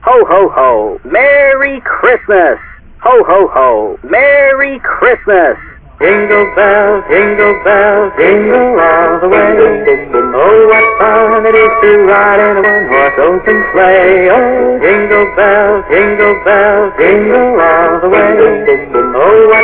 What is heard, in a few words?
Hohoho merry xmas jingle bell, Human singing song